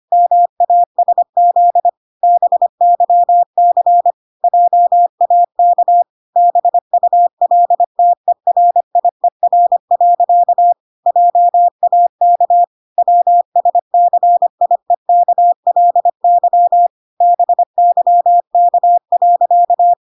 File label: 25wpm